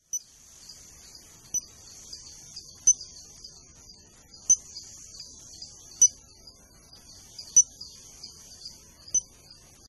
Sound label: Anodonthyla moramora